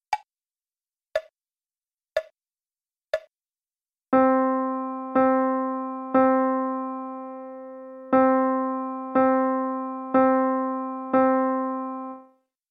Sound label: rhythms